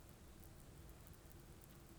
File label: pulses, BALAENOPTERA; MONACO 2022-07